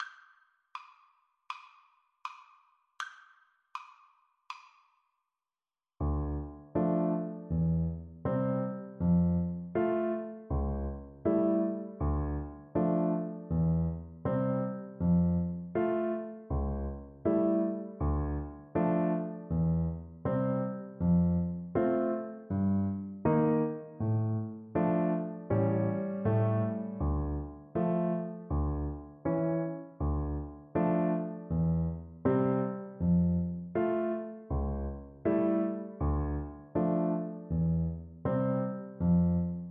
Trumpet

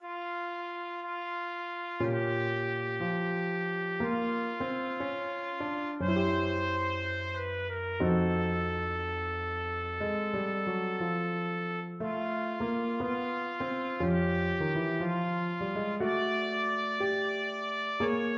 jazzy, trumpet; happy jazzy birthday

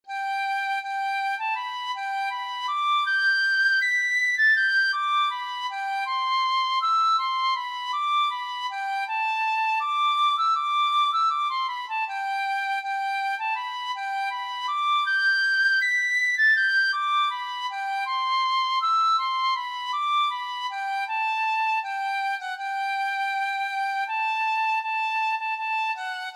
scotland the brave, tin whistle

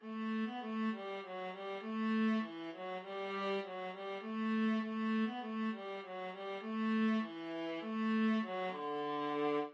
London Bridge, viola

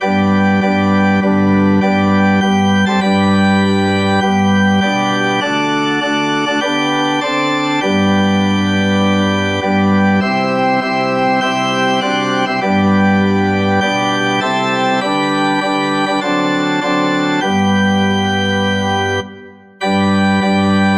saviors love, organ

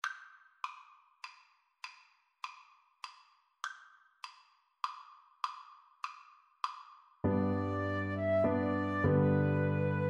Piano Trio

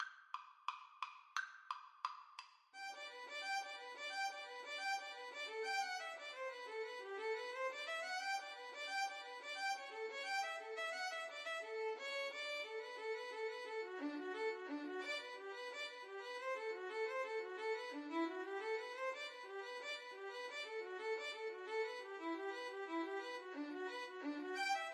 Violin Duet

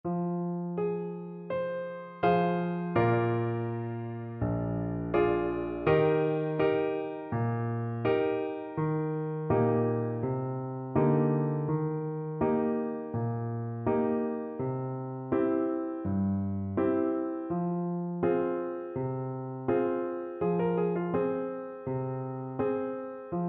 Clarinet

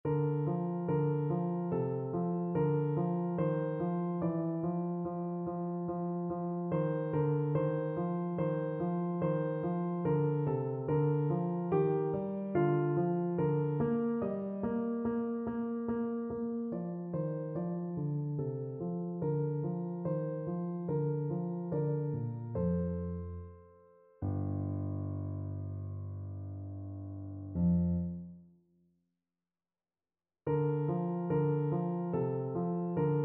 schubert piano

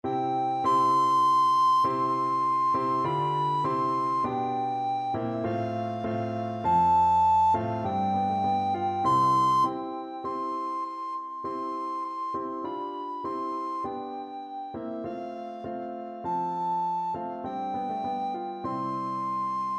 boars head recorder

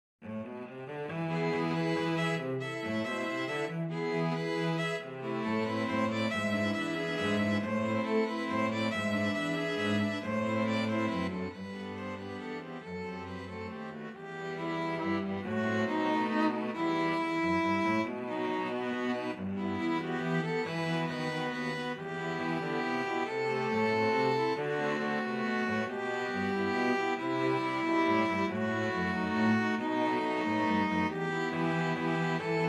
danza danza STRQ, string quartet

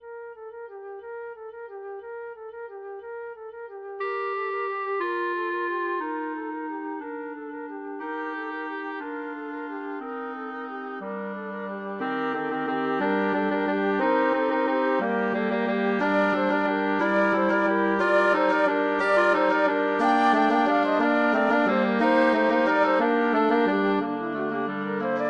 carol of the bells, wind quartet

